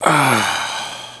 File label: DYING